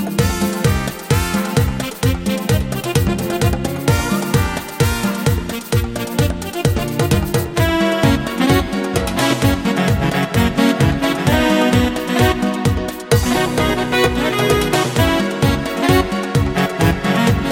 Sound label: Charanga